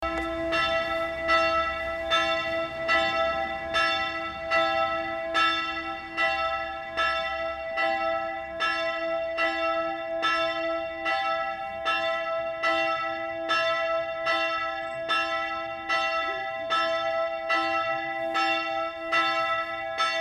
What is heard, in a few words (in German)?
Glocke; josefshaus annen